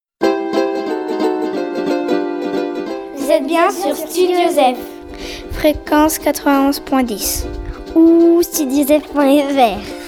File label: jingle pad; TAP